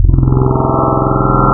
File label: SWEEP, wavetables Classic Synths